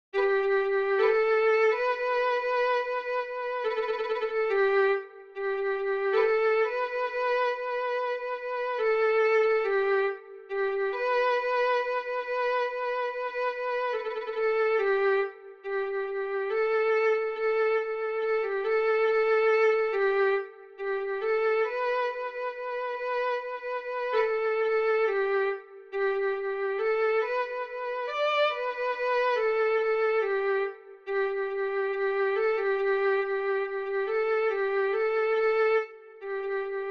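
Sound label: violin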